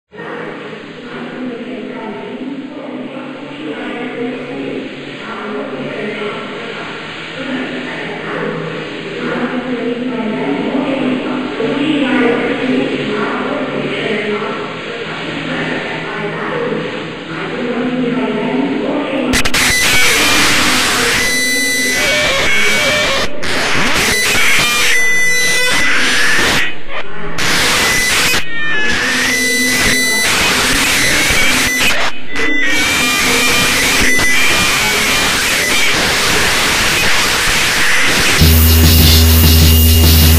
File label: noisy